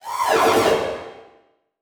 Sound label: Transition Whoosh Alarm; Production Element; Title Transition; AdobeCC